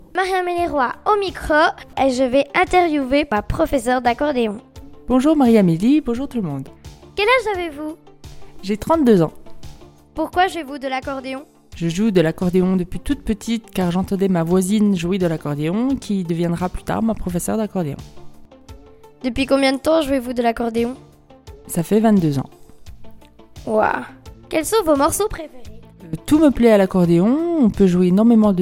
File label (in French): ACCORDEON PAD